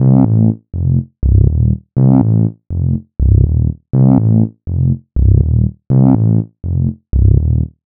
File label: Cmin Freak Bass